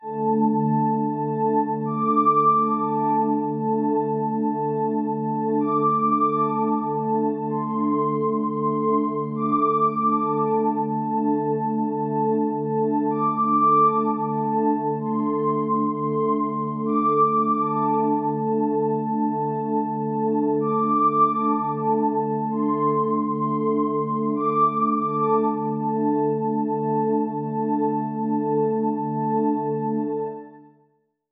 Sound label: Choir Pad, Roland JU-06; wind; SoundDiviner Project; Roland JU-06 Pack06; DigiD wind pack